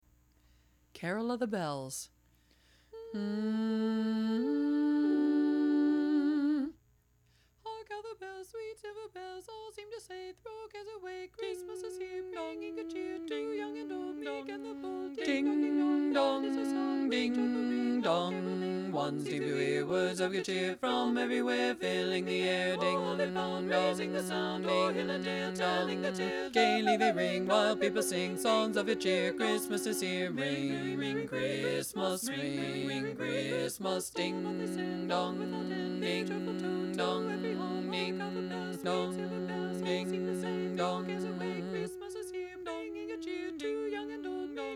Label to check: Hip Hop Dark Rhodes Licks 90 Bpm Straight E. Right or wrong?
Wrong